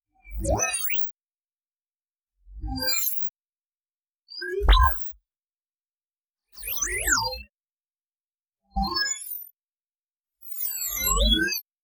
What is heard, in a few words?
Electro Alient High String